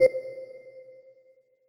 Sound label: New Message